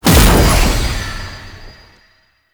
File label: Cast Impact; Warframe; Uriel; Remedium